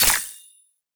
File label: Vendor Conquest Modifier Select; Entrati